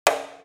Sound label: fx pop; Cartoon - film effects